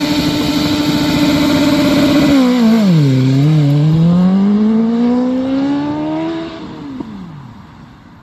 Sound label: S1000RR launch